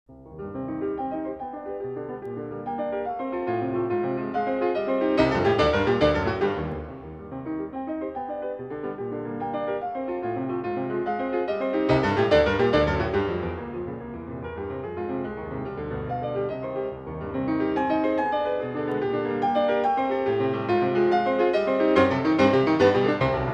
sonata for piano in e major allegro molto e vivace; n13; op 27 n1